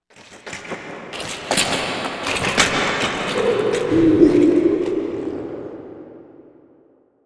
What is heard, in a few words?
Cave Ambience; Skullken; ambience ninjago